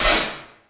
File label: clap